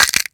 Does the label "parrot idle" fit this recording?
No